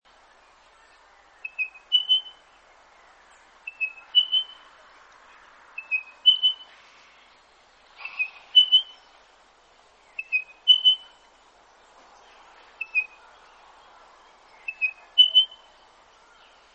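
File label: Spotted pardalote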